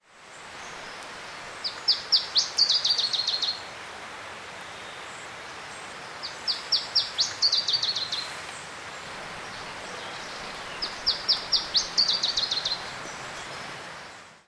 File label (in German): iberienzilpzalp